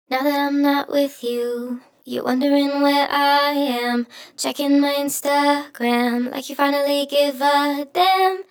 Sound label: Chorus